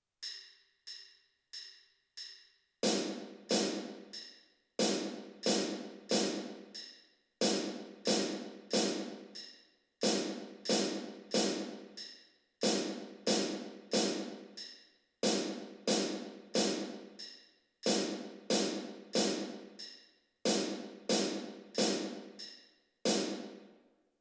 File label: band on parade snare drum